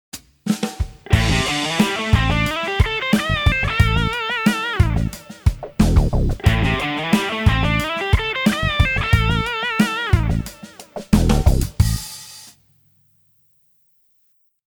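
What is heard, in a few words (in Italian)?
Pentatonica minore con tre note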